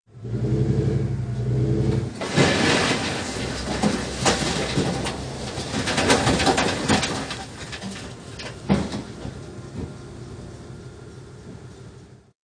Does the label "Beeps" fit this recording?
Yes